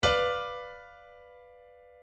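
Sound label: piano chord B diminished; circle of fifths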